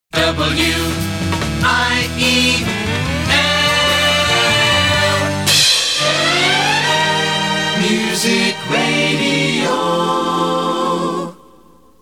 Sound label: jingle